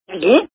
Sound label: swallow